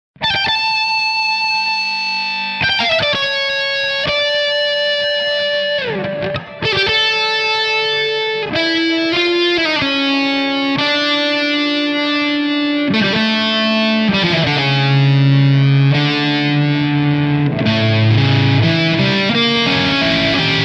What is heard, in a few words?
Toccata metal